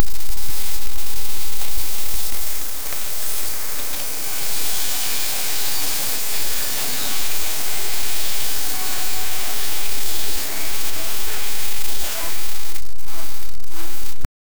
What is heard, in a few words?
noise gripper noise, industry